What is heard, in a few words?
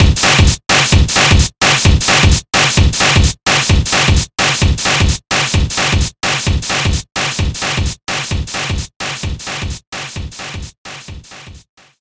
Invincibility, Ethno Circus; sonic rush original sound version